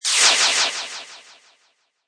Magical Blast Laser Delay, Magic sound of casting spell, conjuring or wizardry